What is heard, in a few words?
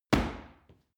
paw kick hard